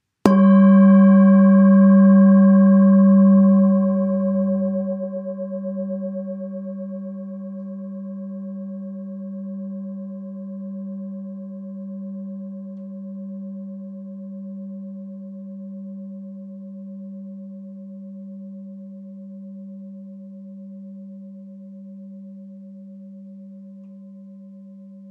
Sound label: Singing Bowl